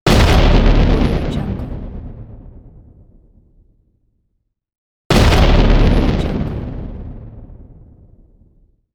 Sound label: explosions